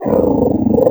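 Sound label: cerberus select; sinfar haks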